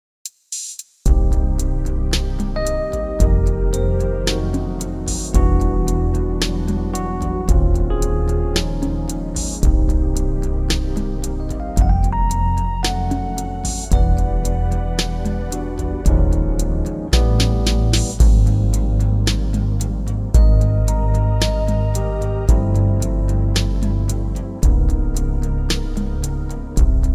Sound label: smooth Ab